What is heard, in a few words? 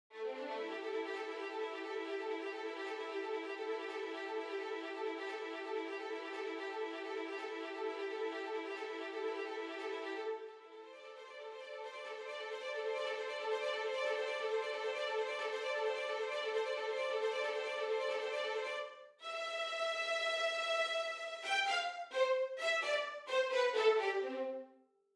Violins